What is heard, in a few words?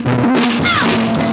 distorto anarchy thing, computery or science fiction